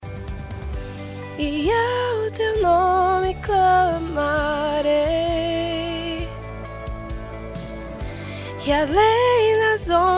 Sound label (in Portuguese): CONTRALTO